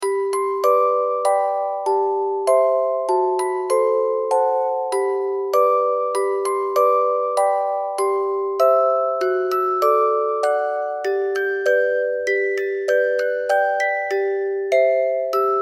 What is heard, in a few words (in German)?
Orgel